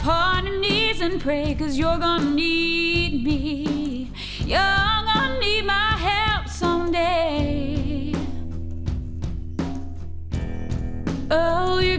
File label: noisy, vocal